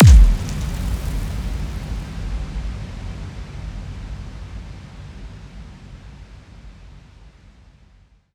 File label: FX Impact Hive